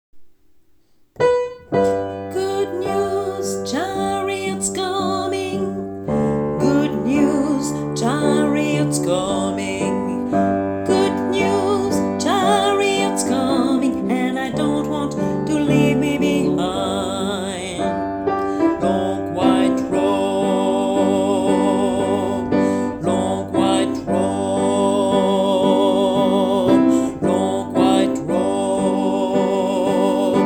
good news basse

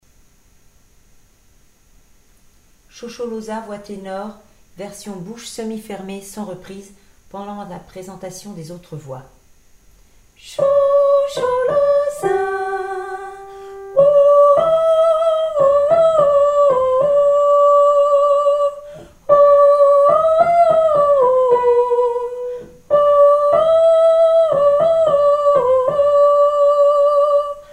shosholoza tenor

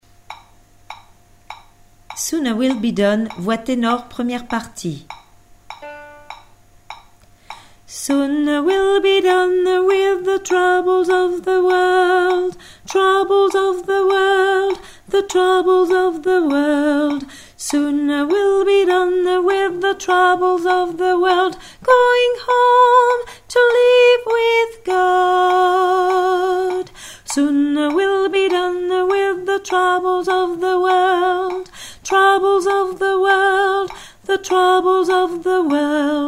soon a will be done tenor

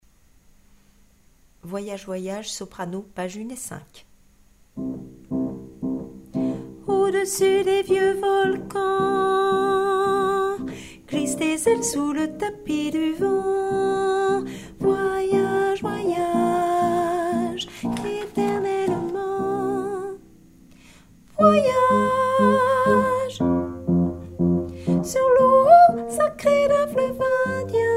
voyage soprano

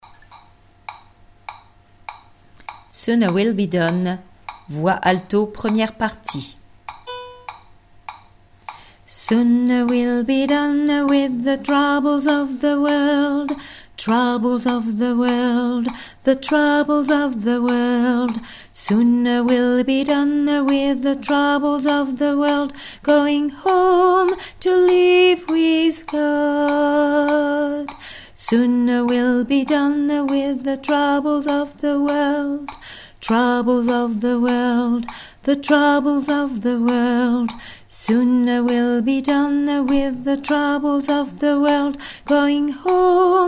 soon a will be done alto

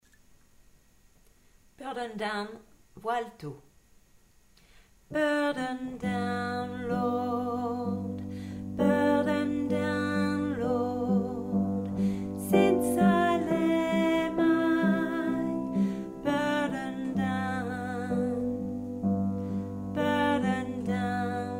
burden down alto

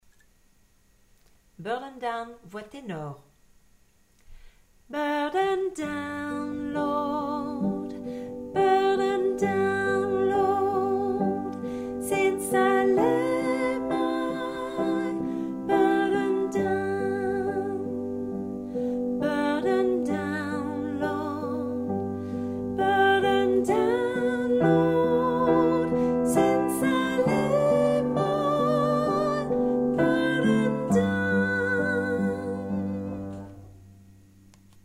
burden down tenor

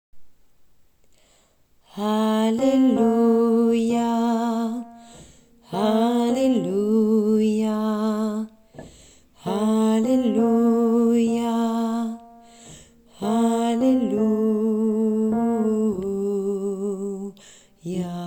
hallelujah refrain basse

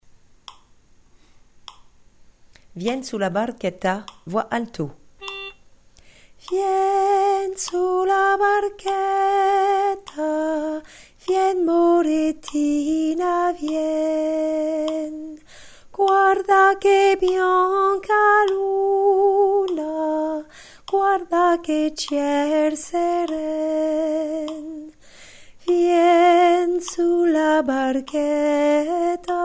vien sulla barchetta alto

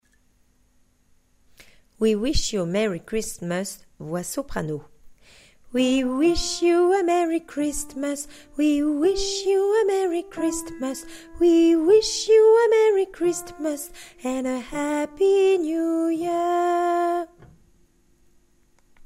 we wish you a merry christmas voix soprano

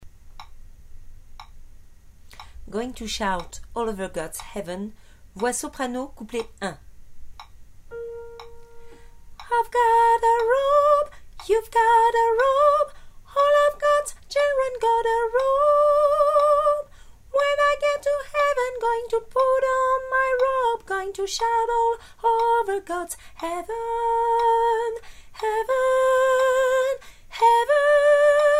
going to shout soprano

